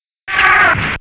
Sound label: switchs